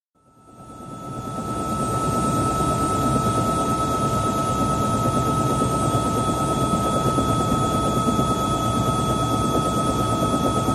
Bell Jetranger Executive Helicopter, interior, descent, land, switch off; Airplane; Helicopters Bell; BBC